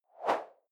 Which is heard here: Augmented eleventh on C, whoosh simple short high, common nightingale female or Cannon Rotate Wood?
whoosh simple short high